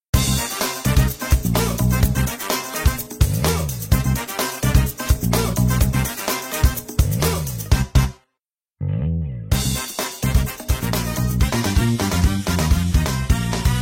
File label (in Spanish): Cortina Musical; Pasapalabra